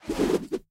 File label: buttons on map; game